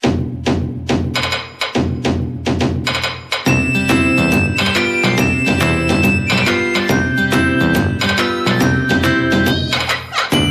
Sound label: Jingle Rpg Map